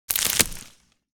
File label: Harvesting in the garden harvest plant cut